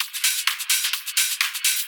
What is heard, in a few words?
Hihat Loop 128 BPM; Best Service; House Definition VOL-1